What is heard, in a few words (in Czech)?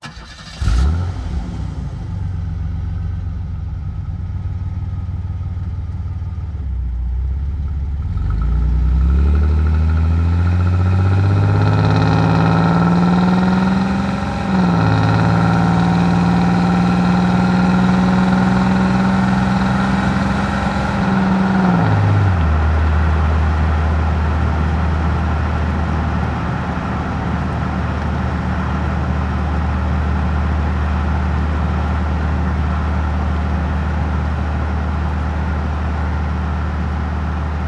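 auto jede; Mafie 1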